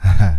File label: Ah Hah; Proteus 2000; Digital Sound Factory Proteus 2000